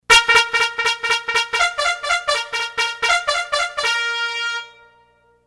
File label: Charge; Game Songs